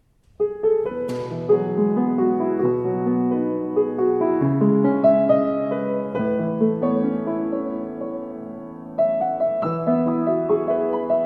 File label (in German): Grotrian Steinweg Fluegel